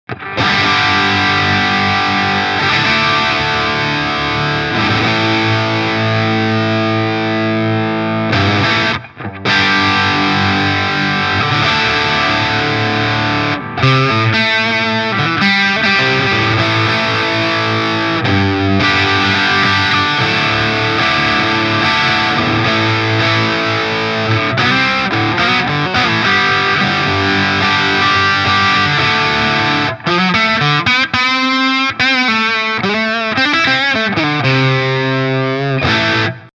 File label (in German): FGN Neo Classic LS 30 Steg verzerrt